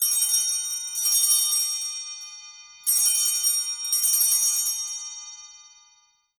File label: Old Timey Phone; Reality Bytes